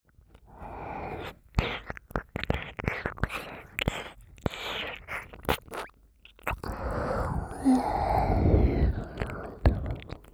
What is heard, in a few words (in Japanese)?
片耳まったり mix